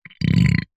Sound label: human male man burp strained mouth closed